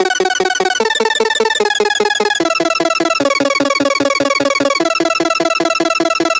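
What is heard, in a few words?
8Bit 150BPM Arp Loop F# minor; 8Bit Sounds; Antidote Advent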